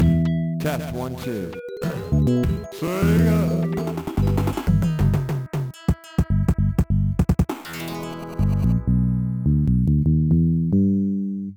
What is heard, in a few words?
Logo (Sega Genesis Version)